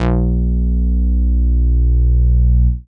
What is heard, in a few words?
CONCRETE BASS; Masterbits; Dance Trax